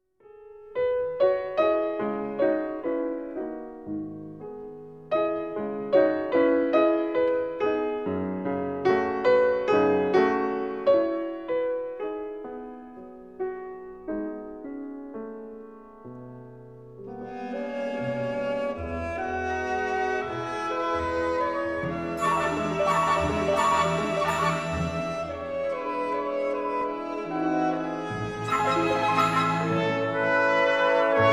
Piano; Ravel Piano Mvt 1; Luxembourg

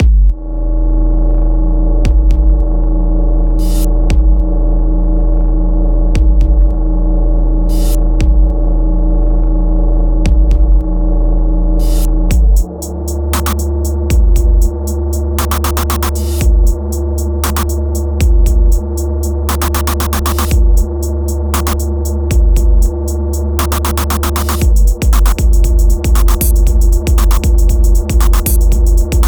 Pulse and Waves

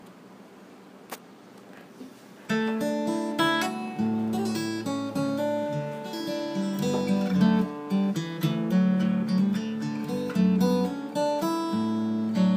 Harp Air DUO; traditional Irish musicians

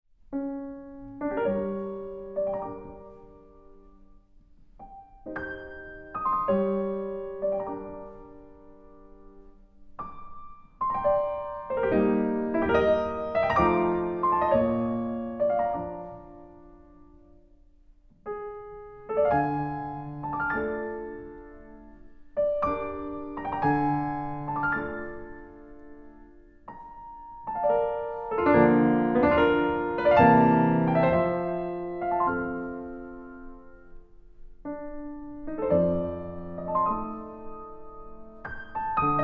Piano; Schumann

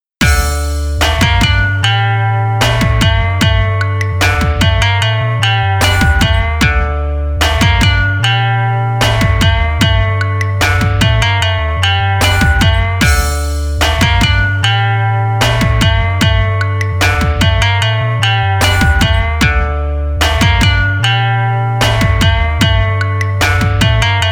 Studio Improv (Instrumental)

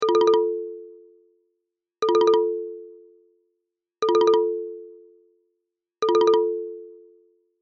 marimba ringtone

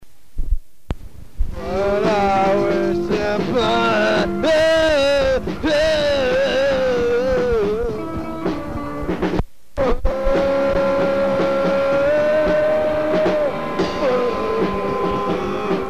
first singing

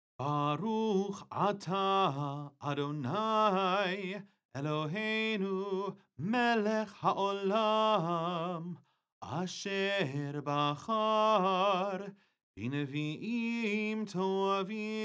Haftarah Blessing (Before Reading)

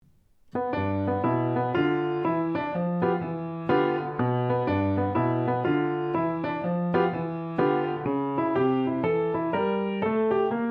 Blues

